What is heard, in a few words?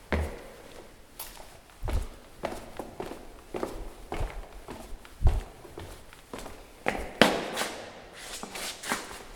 Footsteps